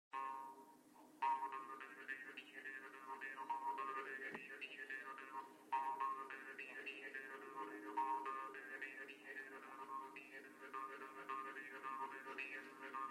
Cantec de joc la dramba; jocuri si cantece batranesti; Vaslui

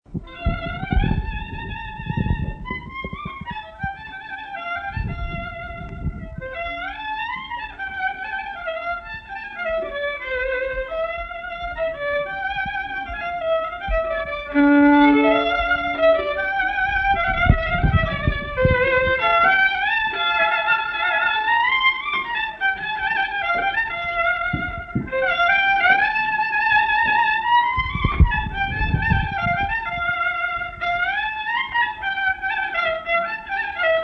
Mimiraua la vioara; jocuri si cantece batranesti; Vaslui

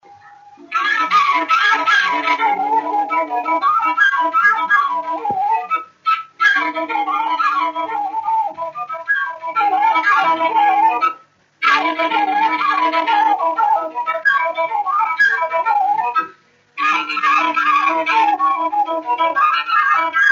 Ursareasca Batuta fluier; Vaslui

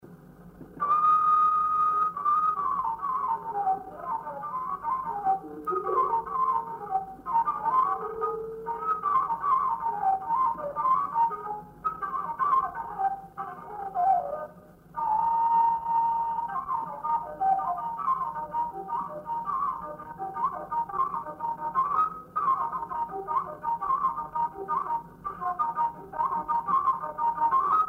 Coragheasca la caval; jocuri si cantece batranesti; Vaslui

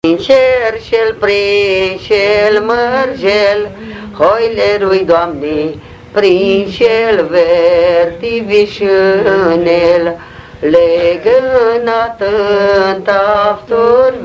joc si cantec popular vasluian; Muzică românească; Centrul Judetean pentru Conservarea si Promovarea Culturii Traditionale Vaslui

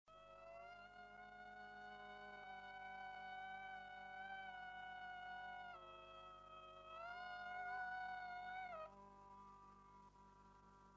Burdui vrancenesc la cimpoi; jocuri si cantece batranesti; Vaslui

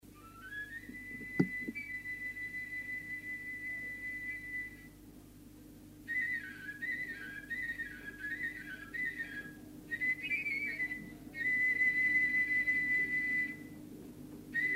Doina la tilinca; Fonoteca Arhivei de Folclor Vasile Adascalitei - CJCPCT Vaslui; cantece de dor si jale

